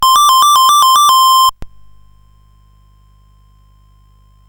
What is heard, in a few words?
Dragonworld sid CSG8580R5